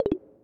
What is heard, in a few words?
Undock; Amazon Fire Phone